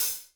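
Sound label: HR16 open hat; Crypton - DrumBase5000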